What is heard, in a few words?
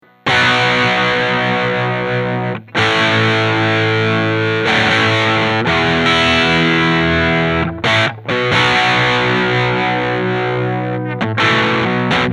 Proto Germanium OD